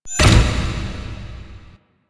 Stairs; Warframe; Entrati; Vaults

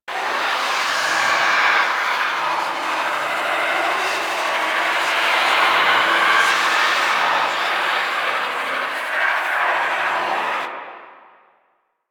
Stalker Spark Grenade Loop; Warframe; Sentient Stalker

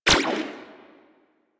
Shot Fire, gun; Warframe; Tenno; Tommy Gun; Stradavar Prime

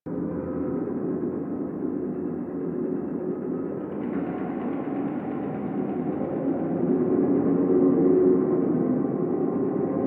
Sacrifice Chamber Ambient Loop; Warframe

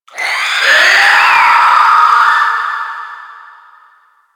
Infested Crawler Dist Vocal; Warframe

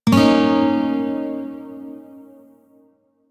Shawzin Yo Chord K; Music; Warframe

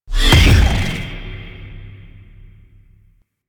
Void Storm Hit; Warframe; Railjack

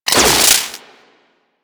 Split Laser Beams Join; Warframe; Corpus

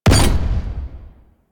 Flak Cannon Alt Fire Explosion, weapons; Warframe; UT Flak Cannon

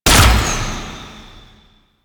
Short Bow Fire; Warframe; Duviri; Dax; weapons

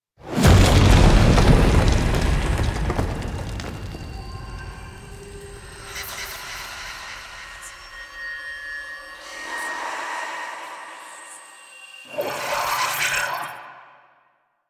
Meteor Crash; Cinematics; Warframe; Duviri Quest; Courtyard

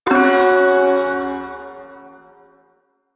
Ersatz Shawzin Chromatic Chord; Music; Chords

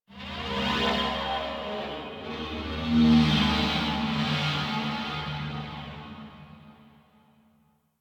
Ship Energy Moan Texture Med, Ambience; Warframe; Corpus Ship Remaster

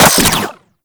Seer Fire Mech, Weapons; Warframe

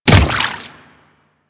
Water Step, Footsteps; Warframe; Grineer Royal Guard

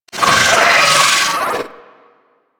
Amalgam Moa Satyr Vocal Death; Warframe

